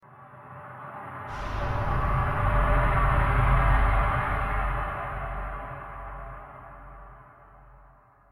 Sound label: Stinger Swell Long